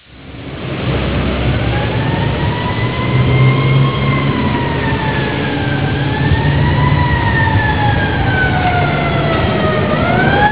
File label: police siren